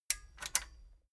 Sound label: metal button stereo click disable slow